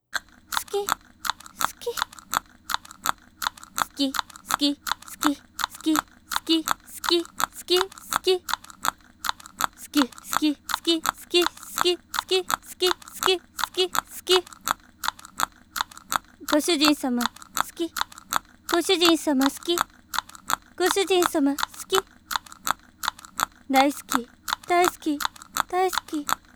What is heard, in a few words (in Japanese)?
故障効果音大 リピート用